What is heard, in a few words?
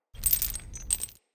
Smithy Metal Misc; Ambience; Soul Plane